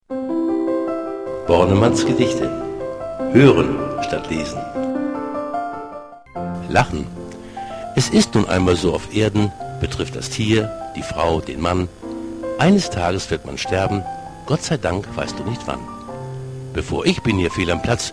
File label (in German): lachen